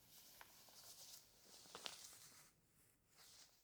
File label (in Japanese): 衣擦れ右へ; 動作系